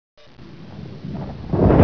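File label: lightning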